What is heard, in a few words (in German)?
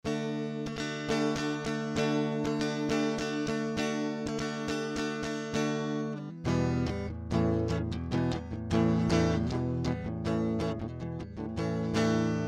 Gitarre; Focusrite Scarlett 2i2 4 Gen